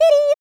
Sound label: YODEL; ILIO; Vocal Planet